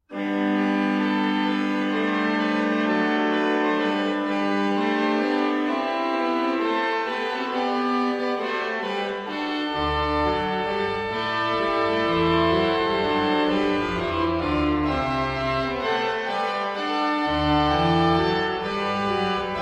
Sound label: Agnus Dei, Dialogue sur les Grands Jeux